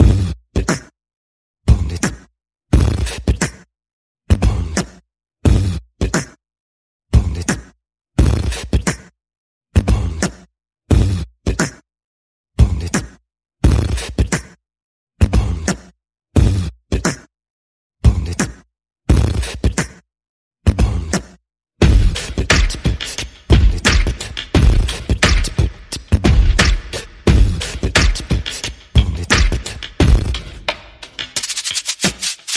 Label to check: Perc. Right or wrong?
Right